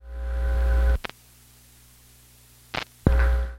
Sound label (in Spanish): grabadora, bobina